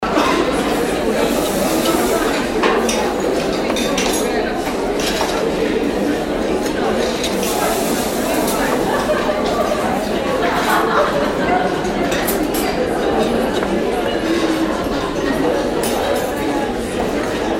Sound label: restaurant crowd